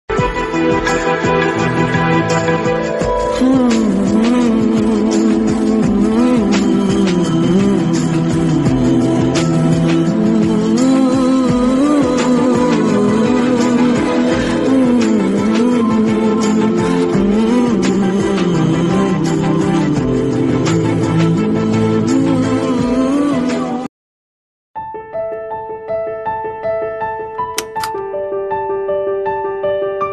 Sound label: instrumental ringtone heart touching music; ghajini